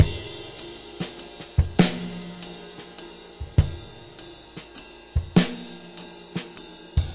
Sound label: six eight loop, 100 to 105 bpm